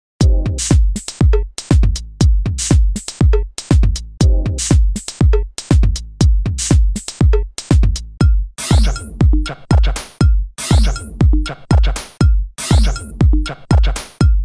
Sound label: tech house loops